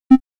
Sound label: Tristar, synth lead